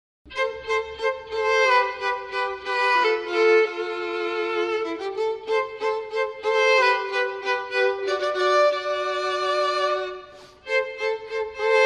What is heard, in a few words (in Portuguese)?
Quarteto de Cordas